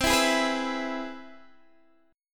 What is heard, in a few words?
C Augmented Major 7th, Guitar, Drop D